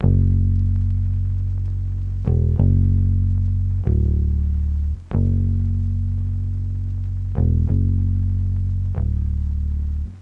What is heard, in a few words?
Bass, 94 BPM F# Min; Cymatics; Gems Free Teaser Pack; Cymatics Gems Vol 9